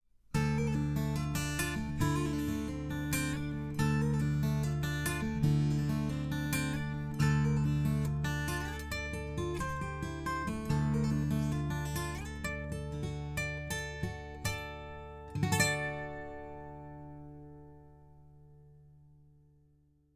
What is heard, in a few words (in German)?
picking 12 bund schallloch; fame pro series sd-100 test; niere